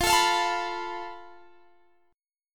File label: A Suspended 2nd Sharp 5th F